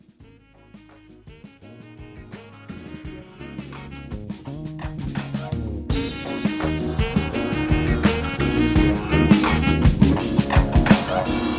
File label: Instrumental